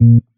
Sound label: marimba; ゆめ2っき